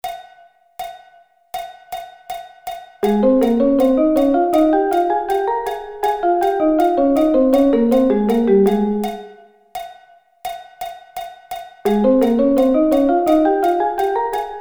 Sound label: Ab Thirds